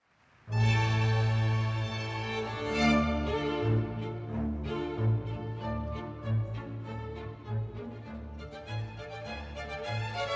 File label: Marche